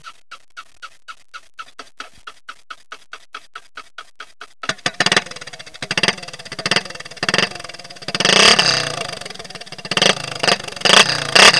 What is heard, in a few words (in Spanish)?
sonido moto